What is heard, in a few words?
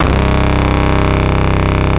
WAILING, DIRE LIKE; East West - Global Sounds